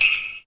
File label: Perc, Alesis DM5